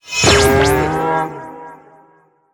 哞菇转化声

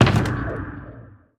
末影箱打开声

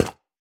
饰纹陶罐 踩踏声

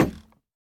竹木悬挂告示牌踩踏声

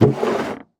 木桶打开声

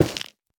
菌柄破坏声

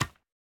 树脂砖踩踏声

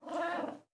豹猫待机声

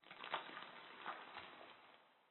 水下裂响声; ambient

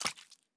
青蛙进食声

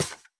刷怪笼踩踏声

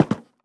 樱花木 栅栏门 开关声

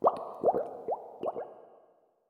气泡柱上升环境声; ambient

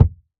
泥坯踩踏声

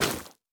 下界疣破坏声

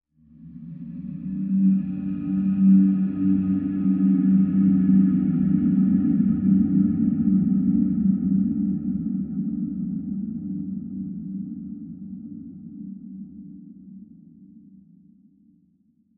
灵魂沙峡谷氛围声; ambient

